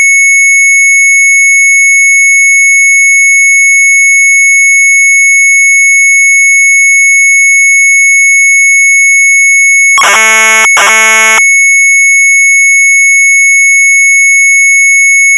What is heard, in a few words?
Sharp MZ800 Tape; DOOLTJE; retromelsarchive